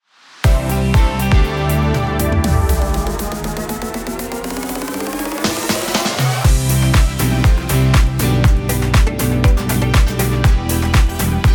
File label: Morning Theme; Radio Oberoesterreich